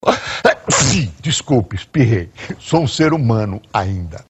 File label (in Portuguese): desculpe espirrei; Atchim! Descupe, Espirrei!; Boris Casoy